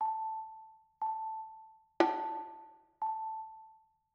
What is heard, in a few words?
rhythm compound 2 beat